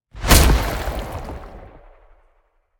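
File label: power impale; smite; Anhur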